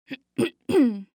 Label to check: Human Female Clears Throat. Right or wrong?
Right